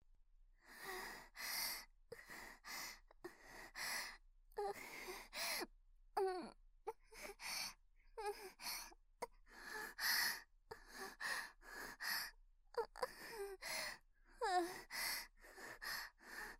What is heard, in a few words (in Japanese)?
フェラ 反転; フェラ（同時）